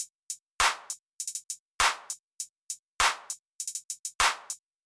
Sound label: Adding closed hat rolls; 拉丁陷阱音乐